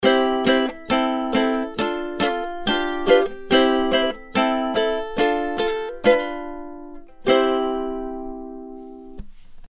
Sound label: Ukulele; Warm Audio WA 8000